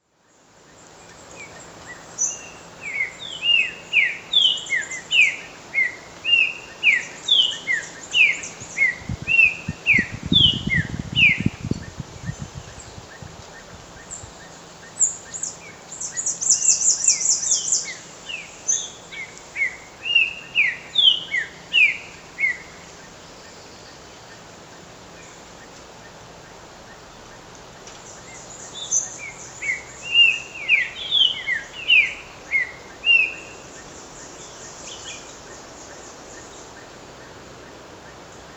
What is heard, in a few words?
Lesser Antillean Saltator Saltator albicollis